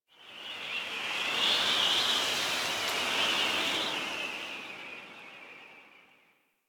Wind Gust Medium, Ambience Plains; Warframe; Eidolon Plains; One Offs

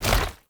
Tank Infested Arm Move Fast; Warframe; Lasrian

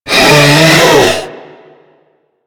attack scream; Warframe; Stalker

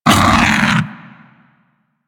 Vocal Pain, critter; Warframe; Hunting Critters; Undazoa

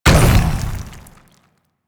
bowl land; DE; Warframe; Warframes; Grendel

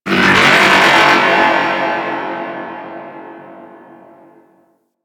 Creature Robotic; Ambience; Warframe; Corpus Outpost

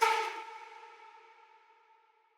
Music Fail, Player Instrument; Arbinn Hills

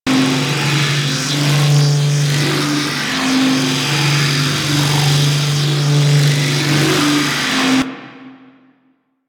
Beam Loop; Warframe; Quests; The New War; Erra; Abilities

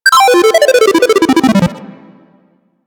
Game Over; Warframe; Wyrmius minigame

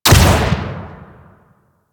Spear Shot Hit, Weapons; Warframe; Tenno; Priest Spear

